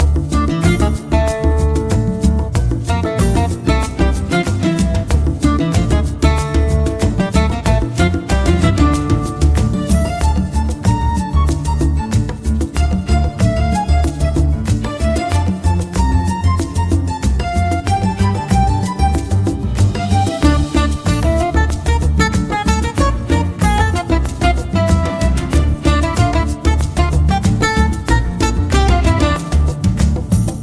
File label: bgm hall